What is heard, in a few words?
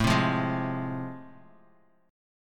A Minor Major 7th Sharp 5th A, Bass Standard; strummed; AmM7#5; 17,15,15,13; JGuitar